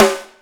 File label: SNARE, WET SNARE